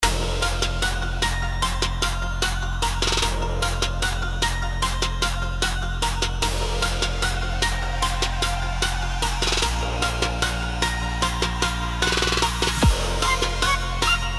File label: Epic hybrid powerful beast mode